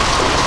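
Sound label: goblin bag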